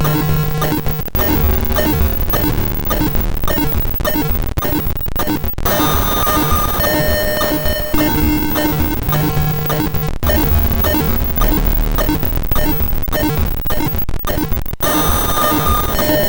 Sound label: saa1099